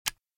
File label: Shotgun - Reload; RadwinIsland